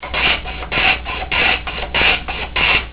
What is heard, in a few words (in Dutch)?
zaag